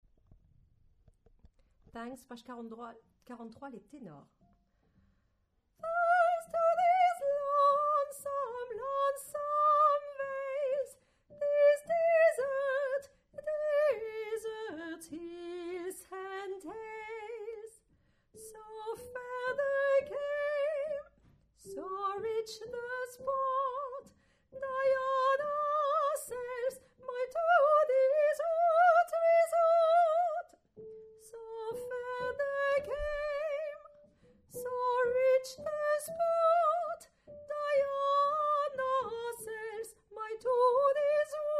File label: tenor